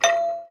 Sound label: match; ROBOTS; pairs